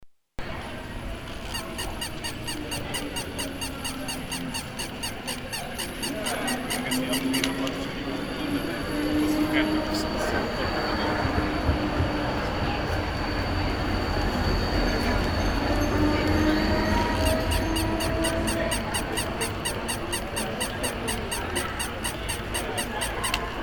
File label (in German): Hund; Linzer Landstrasse